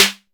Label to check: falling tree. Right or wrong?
Wrong